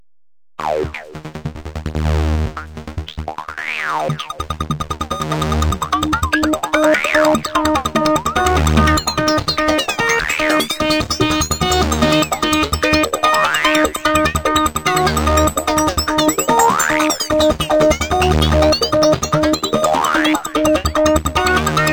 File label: ms20 4 track Lo Fi